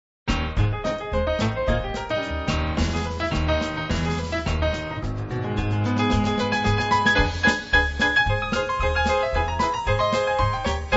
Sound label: maple leaf rag